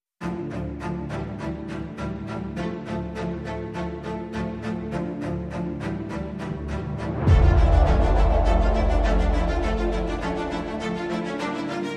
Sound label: Epic Cinematic Trailer; Bootflare